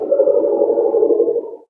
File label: Level Ending Tune; Ricochet